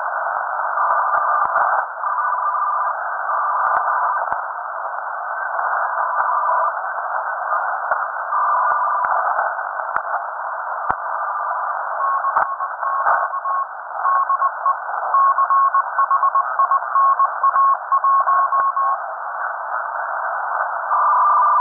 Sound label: Illegal Dataplex on 7086.5 kHz; Global Link (China)